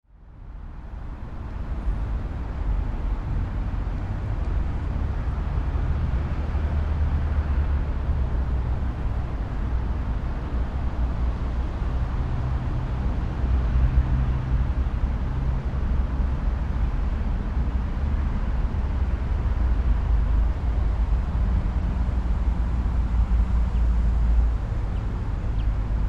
City Traffic Constant 2, Medium shot some close passes; odd voices and footsteps; Traffic And Car; Tom Hutchings